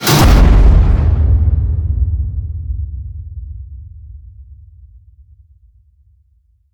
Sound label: HIT Metal Crash, Cinematic Hits Designed